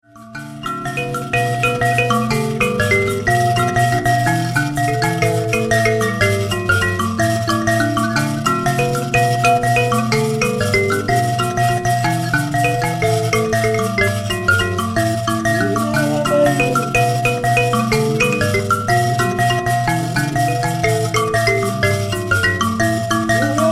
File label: mbira